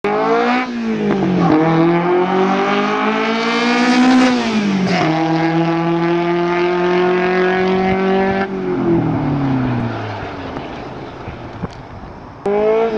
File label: brake test flyby